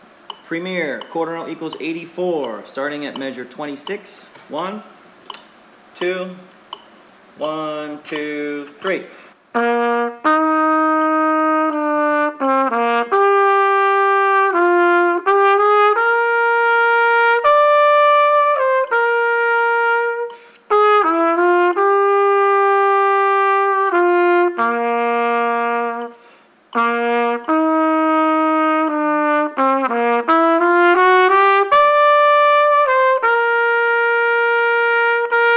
Play-along, qn=84; Premier Solo; trumpet

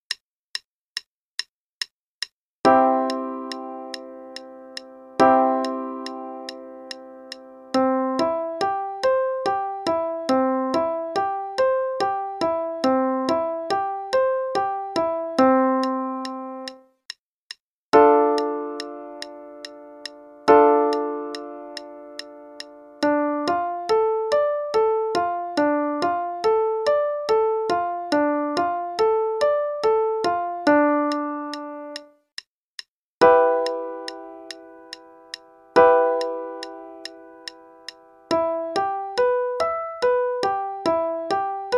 One-Octave Arpeggios (RH, en=144); Level 3A - 2nd Ed

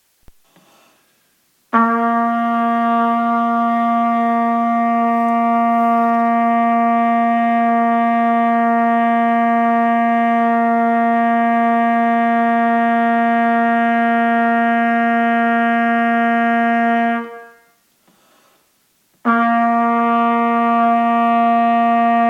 Long Tones Low C, trumpet

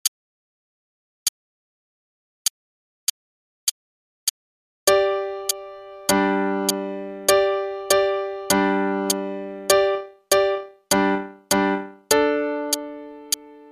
American Fiddle Tune (qn=100); Traditional; Faber Adult All-in-One; Adult Book 1 (All-in-One)

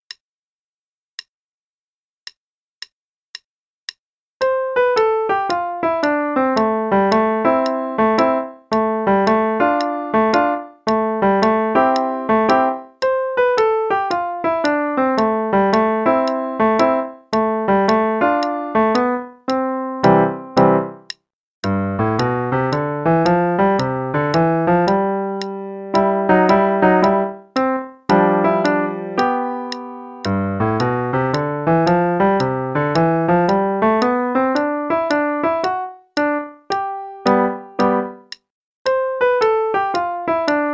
swing feel, qn=112; Level 2B - 2nd Ed; Jumpin' Jazz Cat (st part

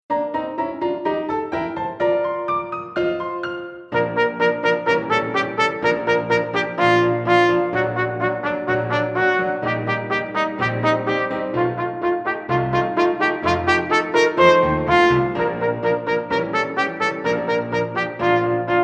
Scherzo; Rubank Solos - Easy Level; trumpet

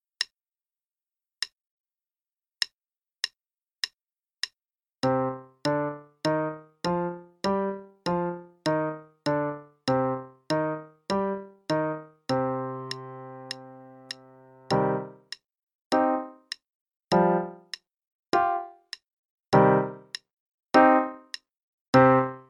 5 Finger Patterns in c minor (qn=100); Piano; Faber Level 2A pg 62

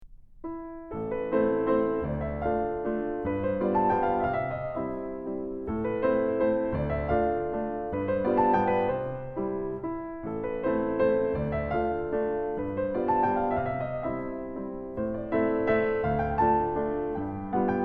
Waltz In A Minor; Magrath Book 07; Opus Posthumous

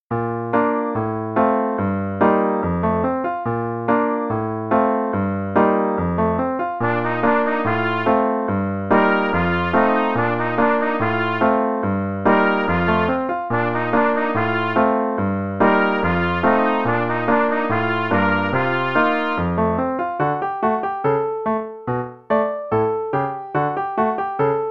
Merry go round (no click, qn=144); The Really Easy Trumpet Book

